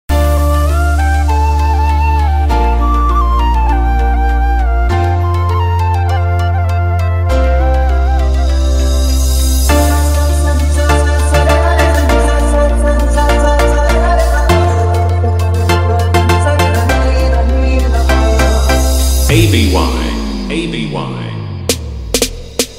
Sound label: Trending Bollywood Dj Remix Song Hard Electro House Mix; Prayagraj